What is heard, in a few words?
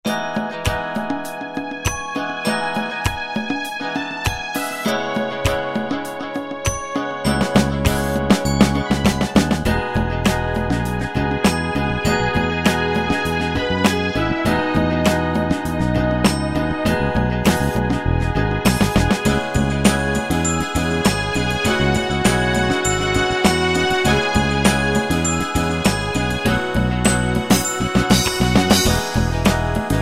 Soul, Yamaha QY70; Preset Styles